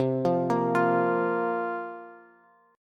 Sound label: C 7th Suspended 4th, Bass; C7sus4 arpeggiated